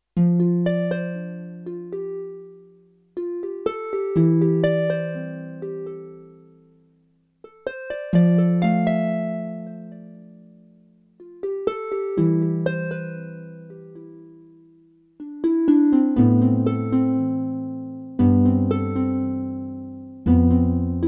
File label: Harp